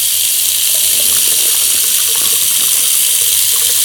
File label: sink; Golden Crayon Sounds